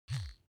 object household razor smooth single

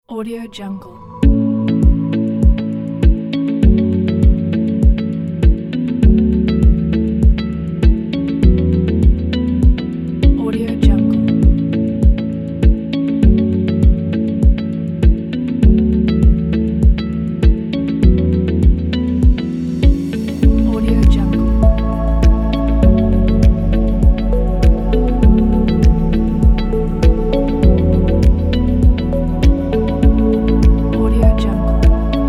positive ambient background kit

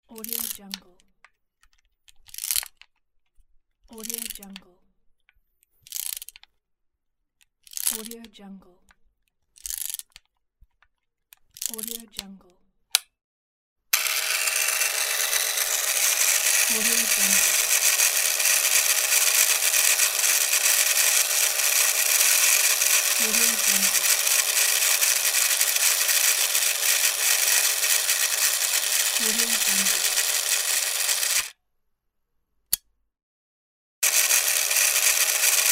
vintage home movie camera

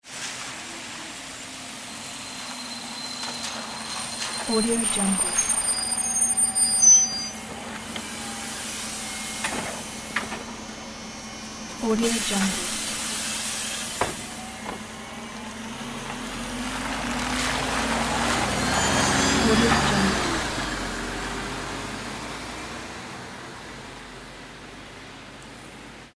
bus approaching stop and leaving left to right

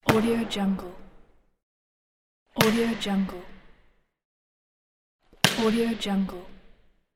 volleyball

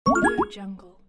win

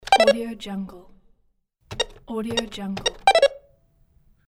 phone buttons; soundfood

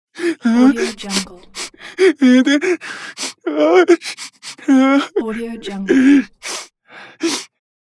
man whimper crying; floraphonic

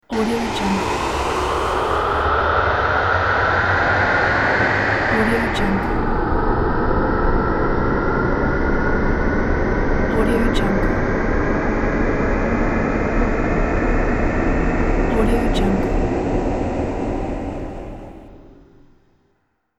ufo space time jump

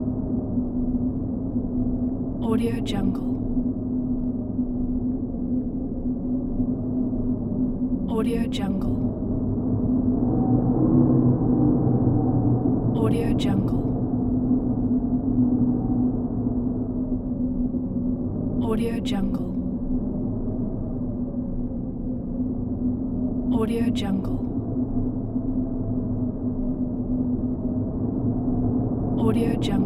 underground ambience; moonaudioproduction

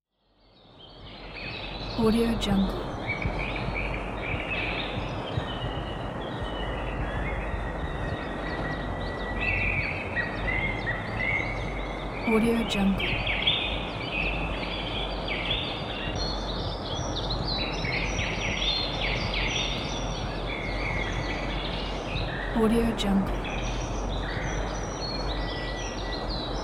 forest; sunnyplanet

